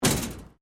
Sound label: METAL SHEET MOVEMENT; Metal (Sound Effects:Materials)